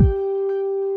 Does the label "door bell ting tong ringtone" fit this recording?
No